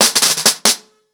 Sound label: FX 90 BPM, acoustic drums; The Roots Drumloops